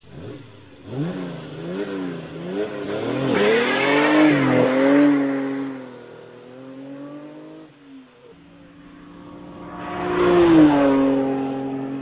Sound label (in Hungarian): Ferrari 365gtb-4 Daytona, motorhangok; autosport